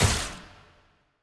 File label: attack heavy; bloom minigame